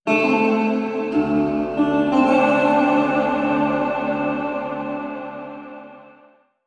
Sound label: Guitar; Type:Rider; PS Vita; Guitar Clarendon 02